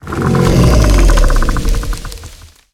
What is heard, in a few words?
Death Blossom Whomper Play